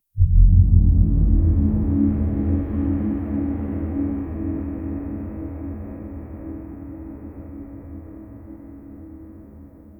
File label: atmosphere deep jungle; ritualistic